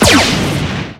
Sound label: gun turret fire; darwinia demo